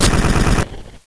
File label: CANNON